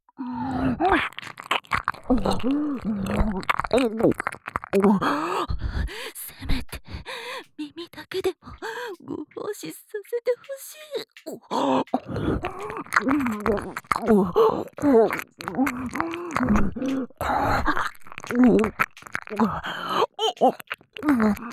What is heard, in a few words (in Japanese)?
耳舐め; 抜きトラック